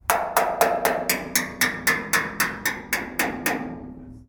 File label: Oil Drum